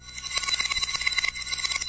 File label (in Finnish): kilistys, Clangy Crunchy Foundsound Kit